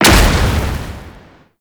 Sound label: Hazard Spark Projectile Impact; Gameplay; Stadium; Warframe; Nineteen Ninety Nine